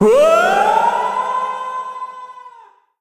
trapdoor; Crypt of the NecroDancer

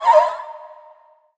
ghast cry; Crypt of the NecroDancer

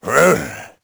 confused; Crypt of the NecroDancer

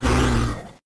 elem ice death; Crypt of the NecroDancer

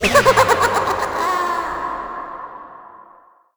leprechaun steal; Crypt of the NecroDancer

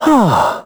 heal; Crypt of the NecroDancer

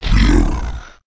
wallmonster death; Crypt of the NecroDancer

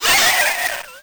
vampbat death; Crypt of the NecroDancer

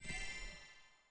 dig diamond; Crypt of the NecroDancer

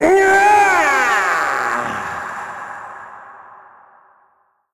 dorian death; Crypt of the NecroDancer

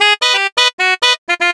horn; TrackMania United; Skins

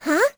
hm; Crypt of the NecroDancer

